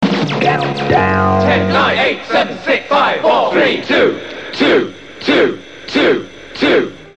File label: Countdown Jingles